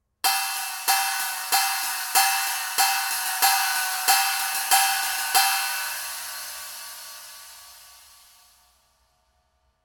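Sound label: 22in Swish Knocker with Rivets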